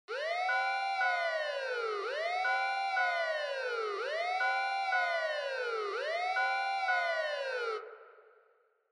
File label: Fire Truck Siren Fake Reverb Siren